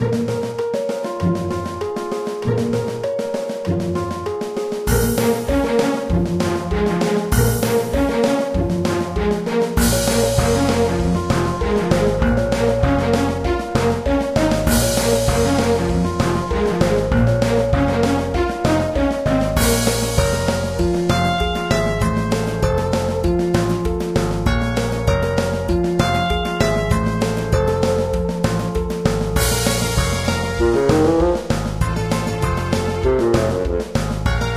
gameplay; Geschicklichkeit; AquaBubble2

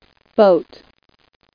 BOAT; KidPak

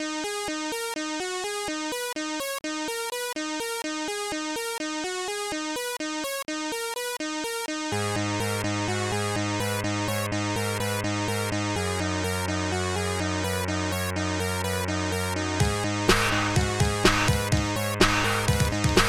tub bells; Archimedes Tracker

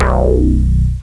Bass Ass; Chris Marker - Immemory

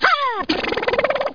drill; gubble

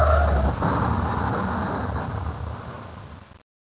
building

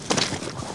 step; wob2 demo

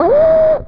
OIYEE; Bangin’ Thing 1.0

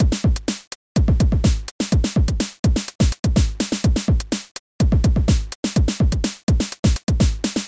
rhytm; amos

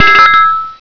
Bonus; MOBICLIC 6

